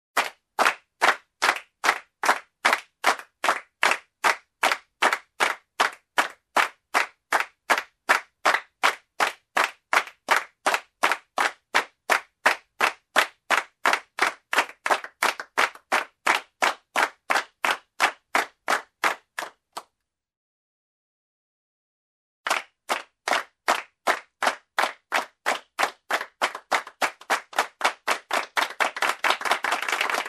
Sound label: Clapping in unison in a small room; standing ovation